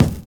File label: VINYL BD, Drums Dance & Groove